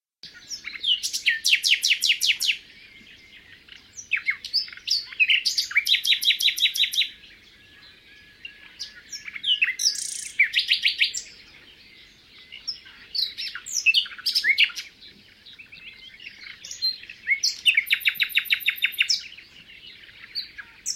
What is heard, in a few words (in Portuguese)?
rouxinol comum